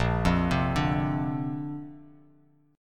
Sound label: Bb Major Add 9th, Bass Standard; arpeggiated; JGuitar